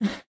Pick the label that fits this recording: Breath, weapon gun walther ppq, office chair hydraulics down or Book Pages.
Breath